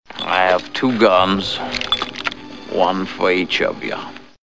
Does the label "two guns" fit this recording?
Yes